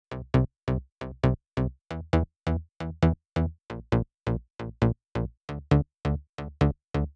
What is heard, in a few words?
134BPM Bassline DRY, C major; Vengeance Samples; Lovely Day Kit